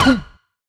stop All; HeavenStudioPlus; Board Meeting

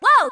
woah; HeavenStudioPlus; ringside

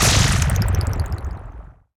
explosion; Heaven Studio Plus; see Saw; explosion White

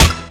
stop; Slot Monster

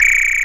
pose Signal; HeavenStudioPlus; sumo Brothers

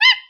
play jump; HeavenStudioPlus; fanClub

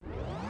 machine whir start; HeavenStudioPlus; dress Your Best

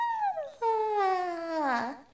yawn; sm64coopdx; char-select-extra-chars

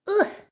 uh; daisy; sm64coopdx; char-select-extra-chars; [CS] Extra Characters

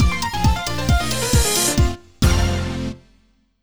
challenge fail; Heaven Studio Plus

